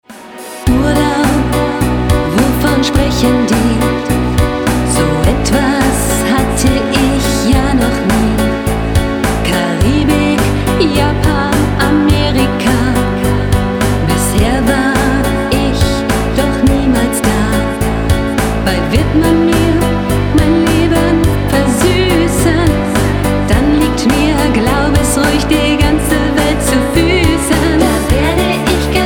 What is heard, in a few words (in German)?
german female gesang